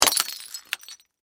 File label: Impact Beer Bottle, Smash; Smashes; Objects; BlastWave